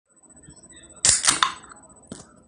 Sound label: popping a top